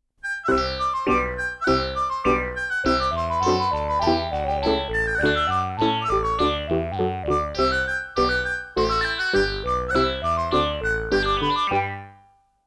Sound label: bright baroque